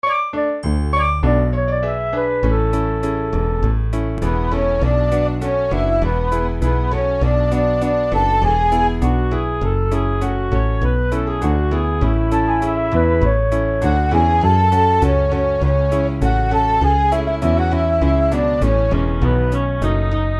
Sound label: lively show tune